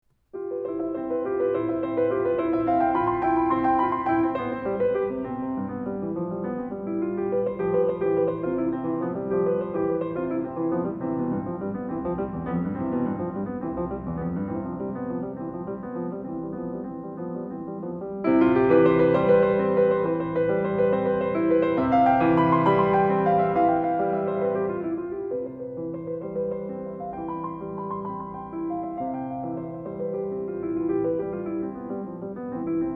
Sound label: Vivace, piano